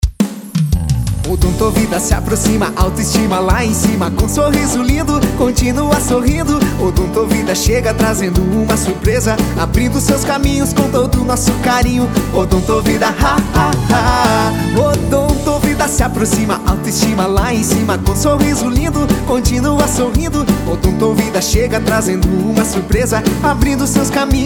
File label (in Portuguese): Jingle; Odontovida